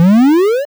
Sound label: SFX Invulnerability Collect; bullet party